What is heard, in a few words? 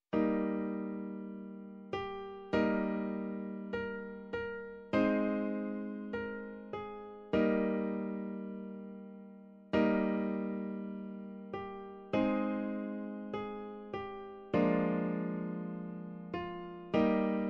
Recognise Chord Progression; Grade 6